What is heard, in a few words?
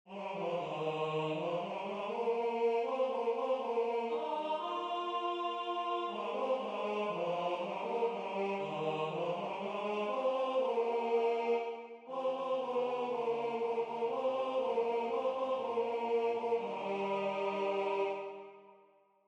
bacchanal tenor; Christmas